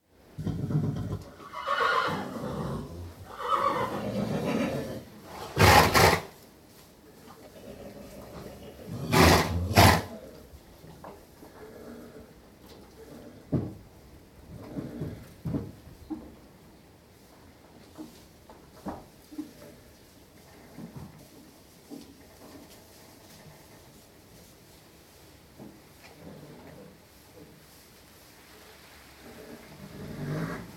Horses in stables whickering to one another & moving around stable, whickering in earnest, frantic calling; BBC